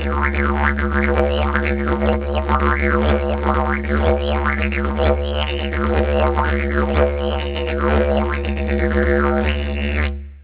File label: didj; cis